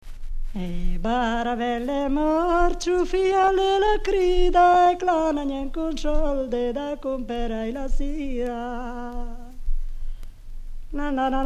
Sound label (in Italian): cantato; emilia; baraben